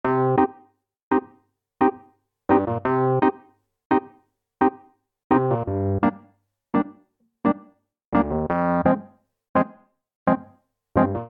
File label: Vamp Reggae i - v - iv C Minor 85 bpm, Electric Keyboards; Ableton; Factory Packs